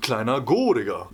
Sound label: hurt; spackenmobs; apored